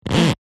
plastic rub squeak with hand; zapsplat; foley

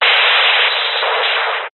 science fiction radio signal interference burst

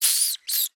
animal rodent squeak single; zapsplat

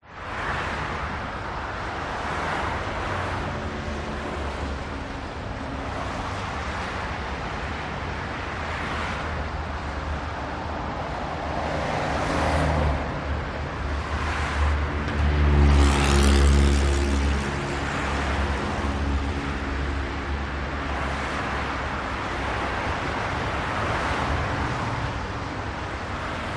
Traffic City; audio hero

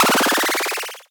game one up extra life

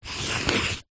office pencil artists thick draw shape paper

